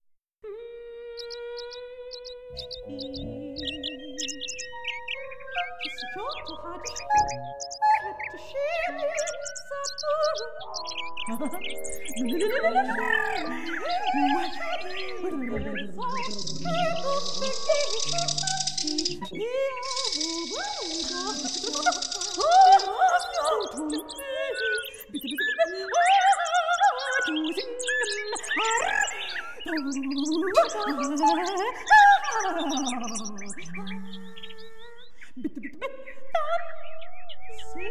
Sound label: Song Birds